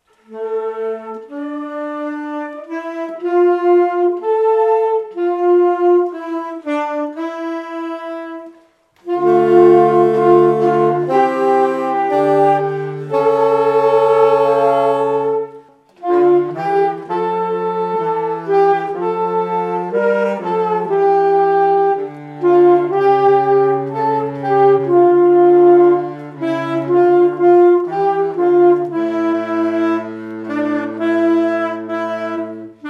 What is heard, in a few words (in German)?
Saxophone und Querflöten; Maria durch ein Dornwald ging; Klasse 6